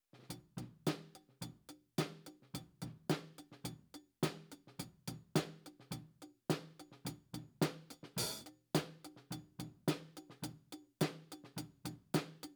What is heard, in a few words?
HiHat